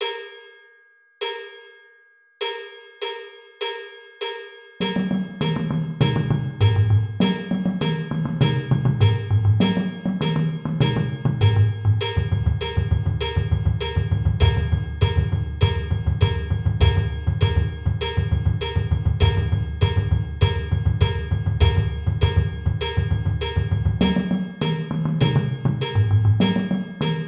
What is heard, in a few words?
Timing and 6s basses